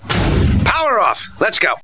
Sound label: poweroff